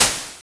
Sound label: noise Synth Release